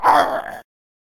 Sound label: Farmer Attack Vocals